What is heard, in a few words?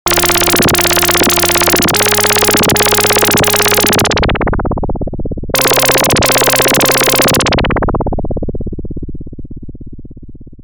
Roland SH-101